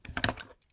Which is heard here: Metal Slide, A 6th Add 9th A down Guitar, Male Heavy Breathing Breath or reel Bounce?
Metal Slide